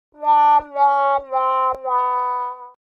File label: Game Over Sound Effect